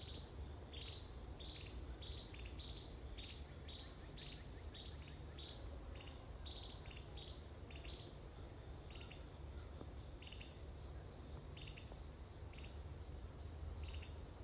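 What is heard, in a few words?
carolina wren with crickets, field recordings; scout island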